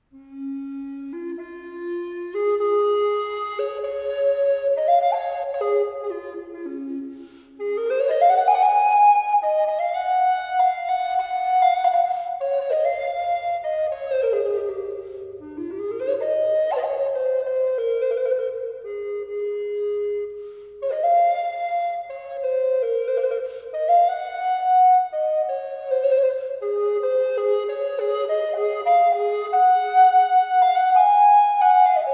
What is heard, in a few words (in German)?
G-Dur Preludes, floeten; Hotteterre